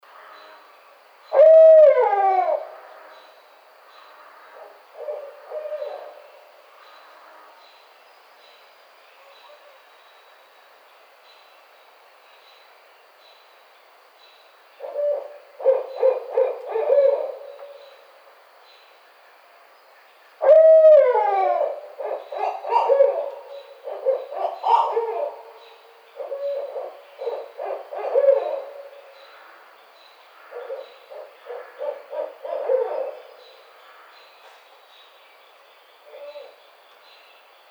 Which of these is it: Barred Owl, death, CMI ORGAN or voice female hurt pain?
Barred Owl